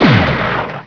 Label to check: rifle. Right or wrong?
Right